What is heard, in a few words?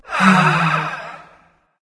nv sigh; smite; voicepack; mercury